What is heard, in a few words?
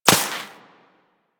Laser Impact Metal; Surface